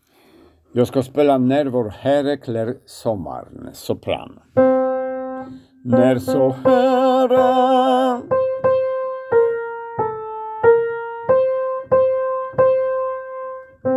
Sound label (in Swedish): sopran